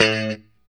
POP A 2, STRAT guitar; Spectrasonics; Hans Zimmer Guitars VOL-1; JC-STRAT POP

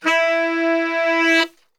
E2 sax

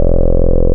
Lil Phat Square, wavetables; Animoog

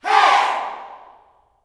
"HEY", Gospel, YELL SHOUT; ILIO; Vocal Planet

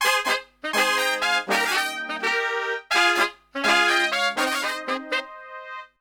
MEX AB, Brass, MEXICO LICK; Best Service; Brass Super Section

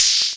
noise, House Misc Perc; E Lab; XTc Files of House

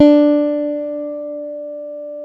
D 3 JAZZ GTR; Univers Sons; Jazzistic

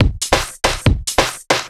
Break Beats, 140 BPM

